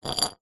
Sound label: foley glass jar slide concrete short